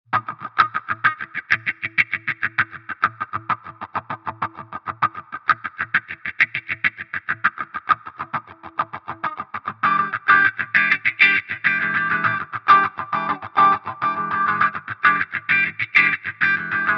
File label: Git. hard cutoff reso Maximum Speed